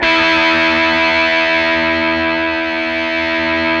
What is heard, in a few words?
GTR, Dirty; Club-50 - Foundations; Paul-Stack